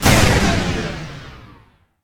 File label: arc stop, weapons